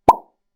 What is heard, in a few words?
clear notifications